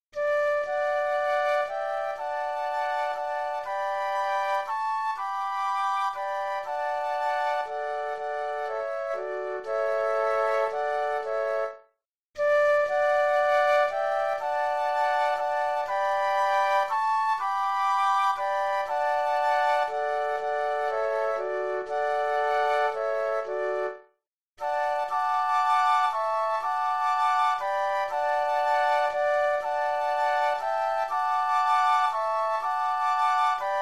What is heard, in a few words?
Flute Trio